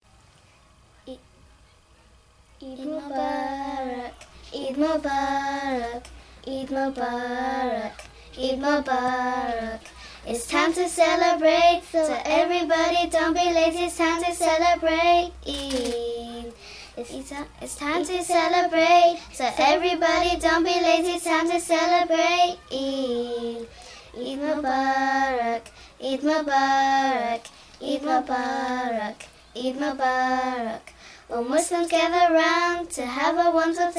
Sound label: eid song